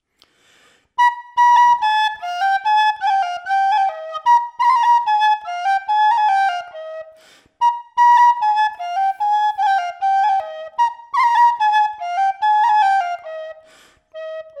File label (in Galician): son pito paulino, Pito Galego